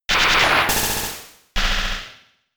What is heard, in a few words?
Zap Cannon